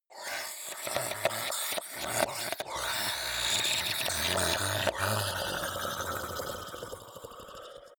zombie grunt choke male wet

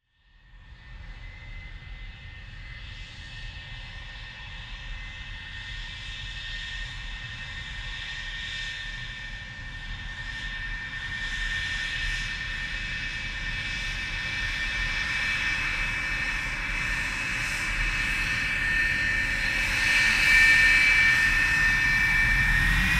jet on runway

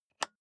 office chair lever

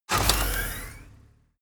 artillery missile truck ready

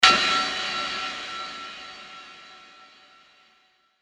ambient metallic ambient impact

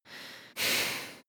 breath female heavy nose

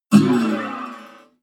bottleneck strings slide down noise

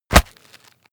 punch low smack hit

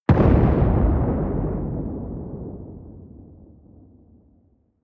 missile large explode distant

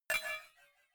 cowbell clank muted soft

